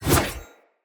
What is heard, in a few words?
Sword Whoosh Heavy; Weapons; Player; Swords; Fey Sword